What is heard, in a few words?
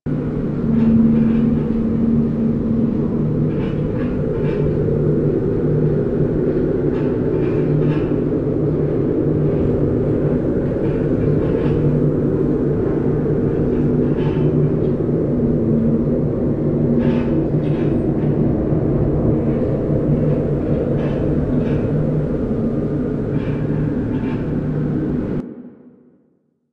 Cave Int Amb A; Warframe; Ambience; Grineer; Grn Interior